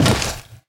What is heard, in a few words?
fs fm plywood, footsteps; Portal 2